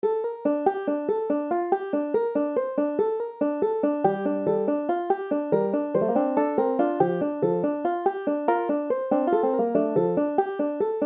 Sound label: tubular banjo